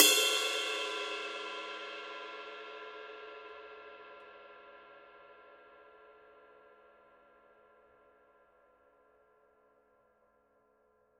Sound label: 21 medium ride bell; paiste; masters